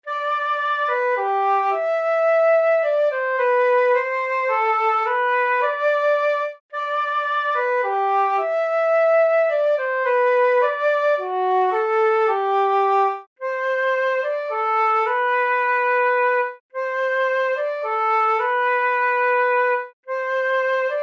solo, 全体の旋律; 中間部の後半は、いろいろな楽器がなるのですが、 / ここでは、トランペットにしてあります。

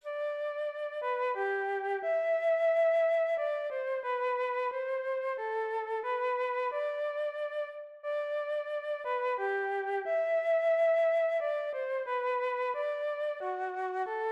大楽節

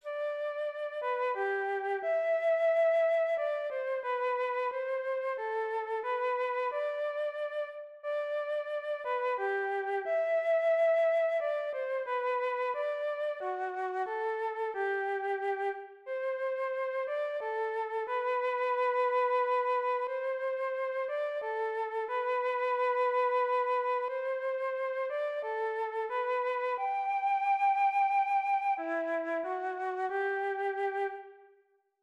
二部形式のメロディー

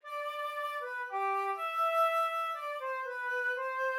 non legato, レガートではないフルートの旋律; フルートのレガート奏法; Kontakt; Vienna Symphonic Library

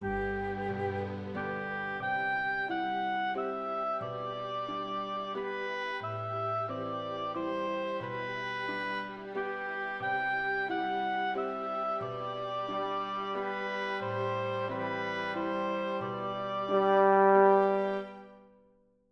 オーボエのソロと、フルートのトレモロ、ハープ; オーケストレーション：中間－１・オーボエの旋律; ビオラ→トロンボーン１→トロンボーン２; ホルンのＧのオクターブが現れます。

